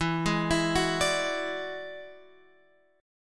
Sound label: E 9th E down Guitar Open F; E9 arpeggiated; JGuitar